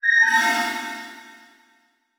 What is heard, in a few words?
Transition Metallic Hit To Whoosh; Production Element